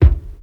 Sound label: dry bass drum single shot c key, kick drum single shot tuned to the C note